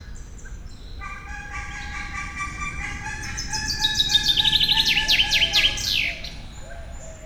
kanadai lúd távolról; szegedi zoo 2011

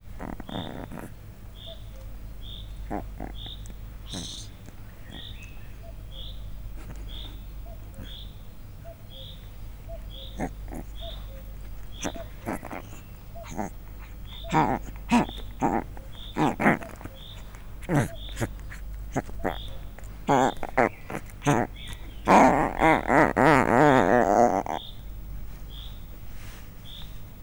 harom hetes kolyok par centire a mikrofontol, leopard; miskolci zoo 2009

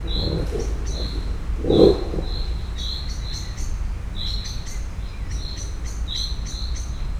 egyet mordul, szibériai tigris; szegedi zoo 2011

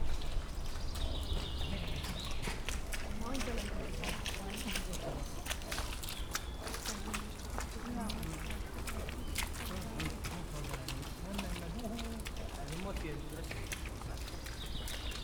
déli dőben sétáló medve, barna medve; pecsi zoo 2017

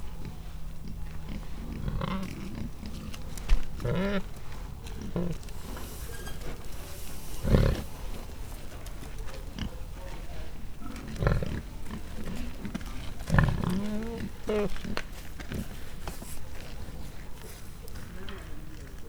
vaddisznó elfutnak a kismalacok; budakeszi zoo 2010

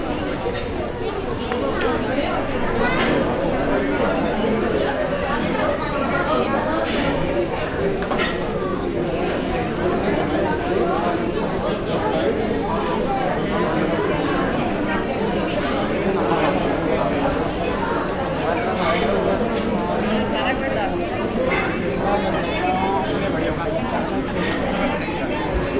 kiulos pizzazo

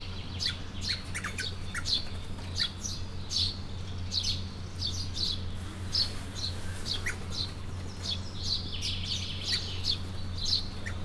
repulo madar rozellapapagaj a vegen, csupaszszemu kakadu; miskolc zoo

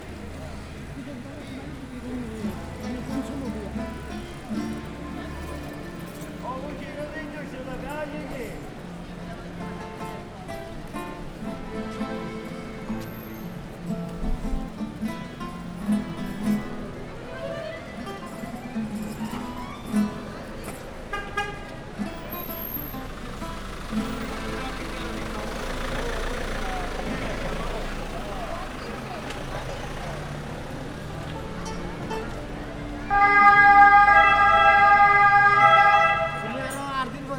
kezdodo harmonikazas rendorauto sziréna

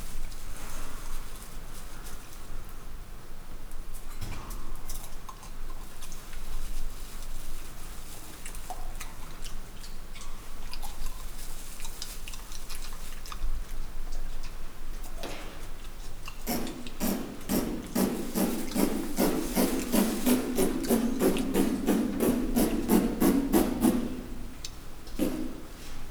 területjelző hang rácsozat, csimpánz; pecsi zoo 2017

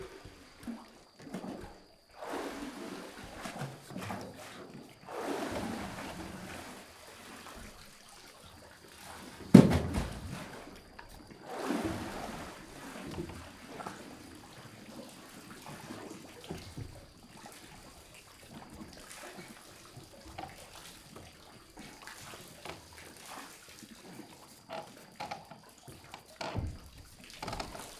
kettő húzás női kar szél, gémeskút; hortobágyi puszta

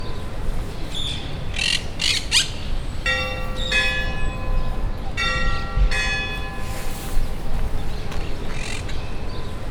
harangocska madarakkal

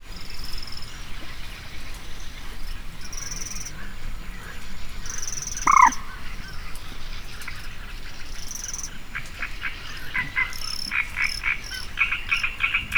kakukk nádirigó bölömbika kecskebéka; Hortobágyi Nemzeti Park 2013

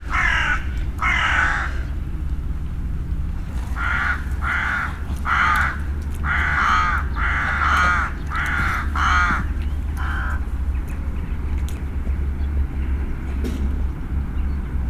vetési varjú autóforgalom; Hortobágyi Nemzeti Park

